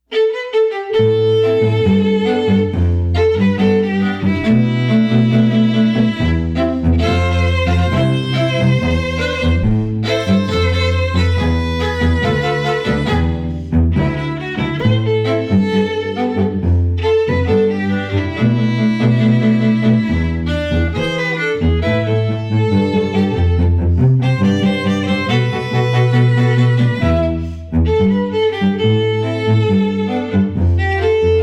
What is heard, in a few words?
Strings; Caprice Strings